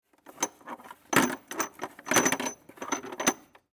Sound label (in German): Schrauben